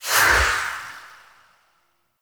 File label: Wisp Movement Dash; Warframe